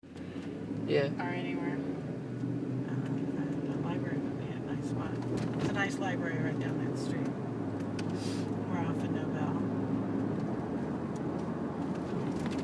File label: car ride